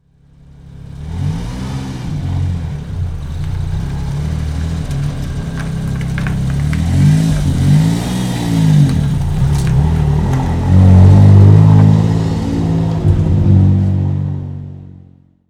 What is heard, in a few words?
car